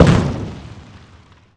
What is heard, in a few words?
medium impact generic; WAR